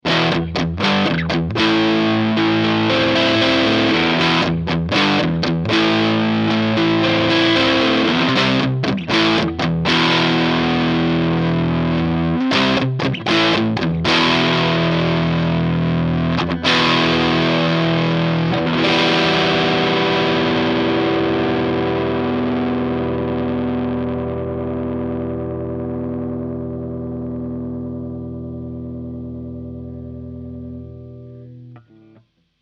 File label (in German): Ibanez MH 400 Neck Zerre